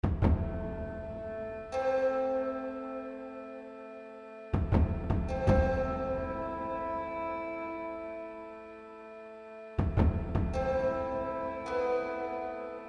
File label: suspense; WIP WYSIWYG